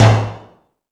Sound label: More Toms, Drum Machines